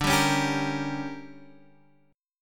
D Major 7th Suspended 4th Sharp 5th, Guitar Half Step Down; DM7sus4#5